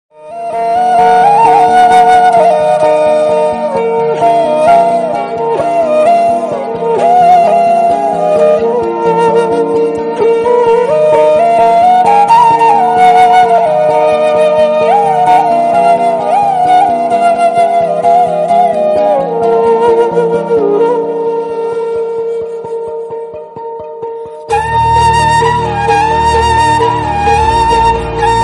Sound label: theme bgm emotional bgm; thambi film